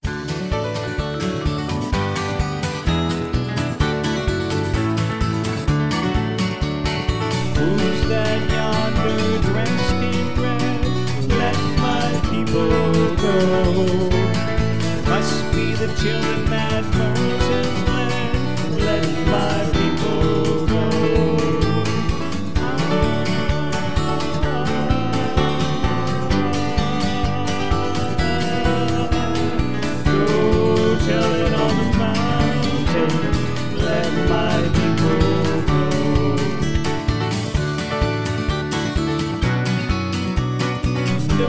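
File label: Instrumental With background vocals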